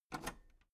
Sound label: metal lever button radio enable medium